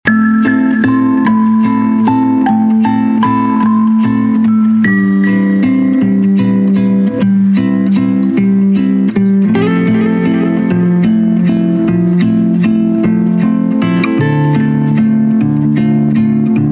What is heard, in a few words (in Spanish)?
Guitarra Bailando